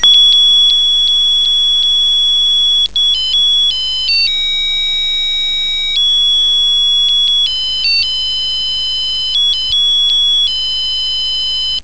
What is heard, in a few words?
melody